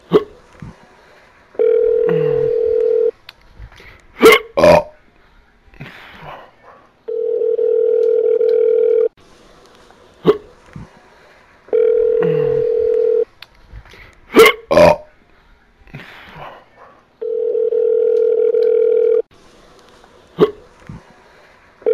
Burp Hick-up

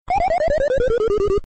Duck Hunt Dog

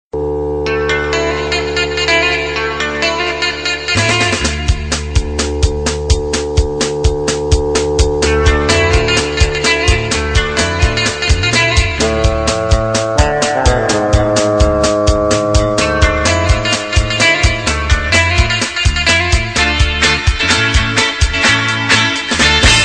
instrument

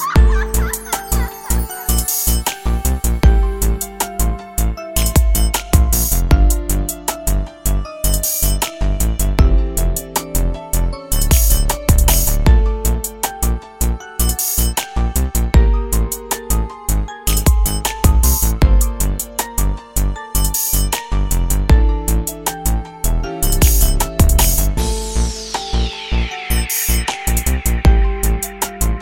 Hip Hop

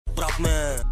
SmS sound

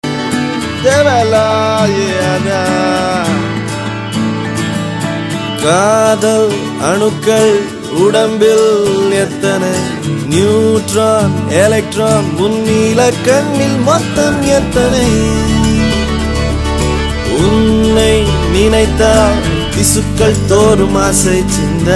Male

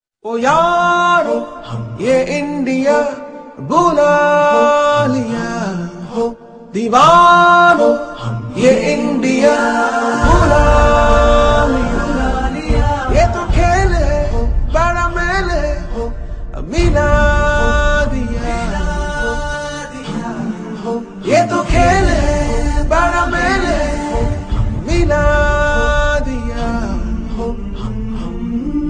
Soft Tone

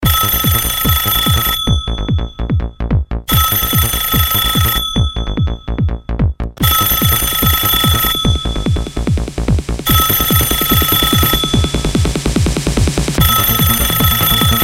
Techno Ring Phone

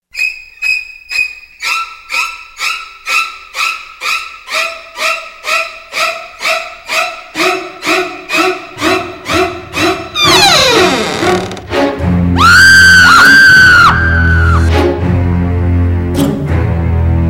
Psycho Scream

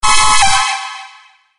Sms Jingle

